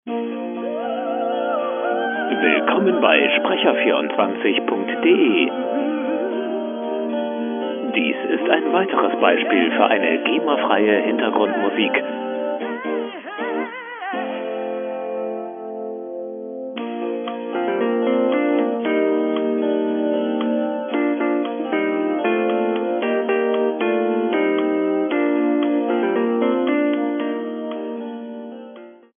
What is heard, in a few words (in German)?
musik